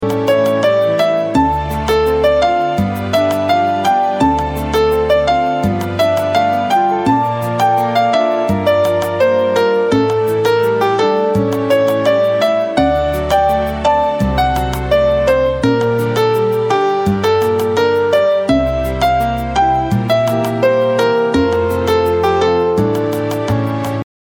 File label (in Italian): fiction song drop, Sottofondi